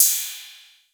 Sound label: HIPHOP KIT CYM; Wizoo - Platinum 24 Electronic